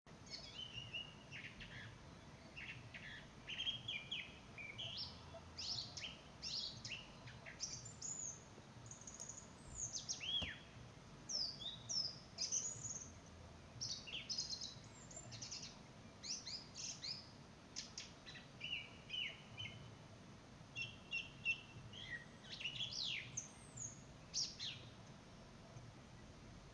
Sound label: Songtrush